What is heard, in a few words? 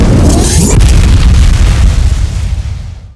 Explosion Sound